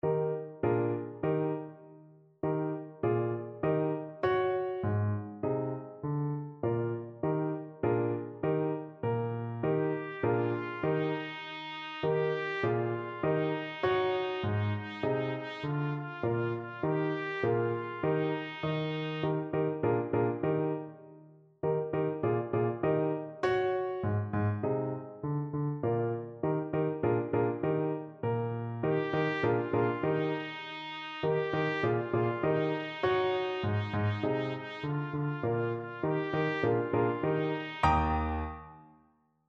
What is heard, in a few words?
hot cross buns trumpet